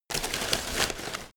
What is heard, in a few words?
paper rustle